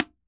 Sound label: Drums Percussion Congas